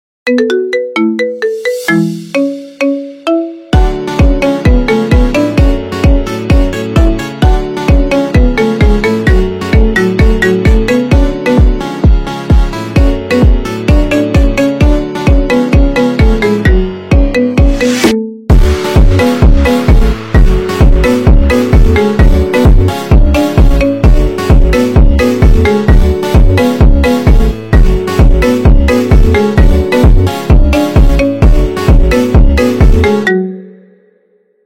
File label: iphone marimba ringtone